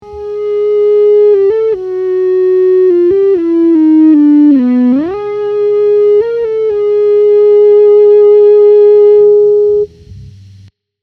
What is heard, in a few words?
EBow